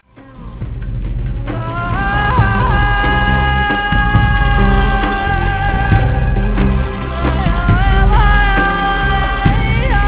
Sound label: turkish music